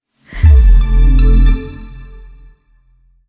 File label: Jingle; Wavestudios; Genedis